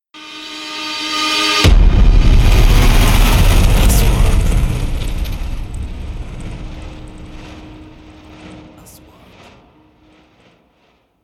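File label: 3D SFX Trailer; مؤثرات تشويقية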